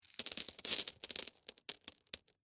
Rocks Set Down, Foley, Handful, Slow, Small, Rocks; BlastWave